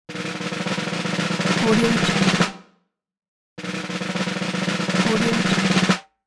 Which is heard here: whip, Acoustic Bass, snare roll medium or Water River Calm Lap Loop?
snare roll medium